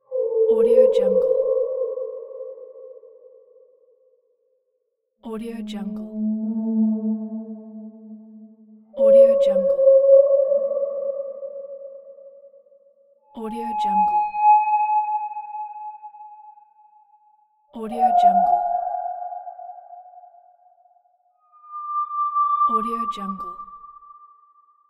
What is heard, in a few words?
whale